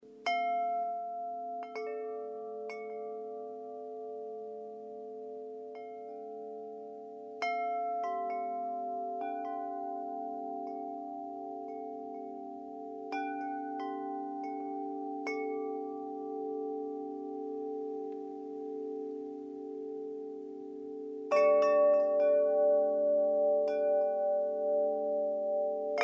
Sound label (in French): carillons